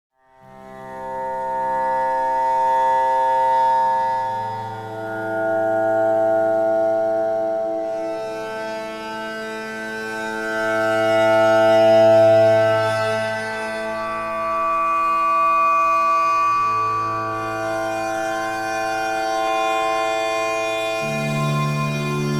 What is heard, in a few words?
Bowed Acoutic GTR; Omnisphere